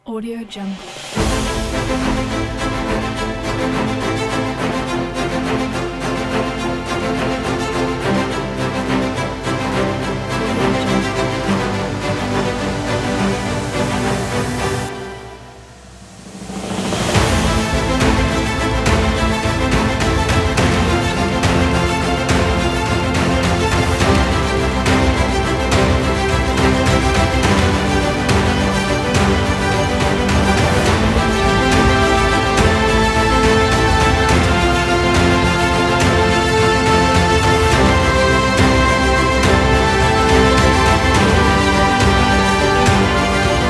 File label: epic cinematic trailer